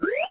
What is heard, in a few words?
fade IN; Crash the Bash - Drink Break